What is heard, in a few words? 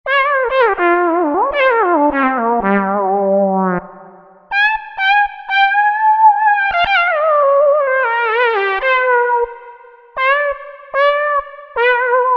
Solo Wah Saw, Roland V-Synth; Factory Patches